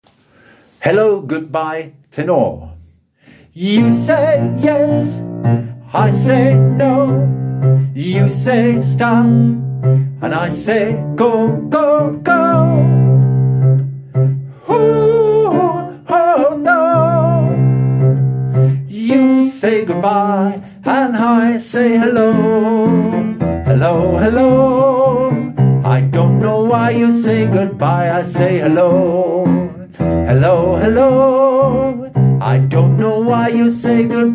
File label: Tenor